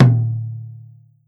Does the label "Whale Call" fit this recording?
No